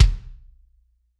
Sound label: Kick Hard, Drums